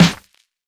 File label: warm snare sound f key; snare drum samples